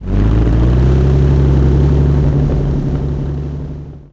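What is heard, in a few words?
comet engine interior load, tanks; War Thunder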